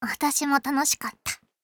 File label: applause; osu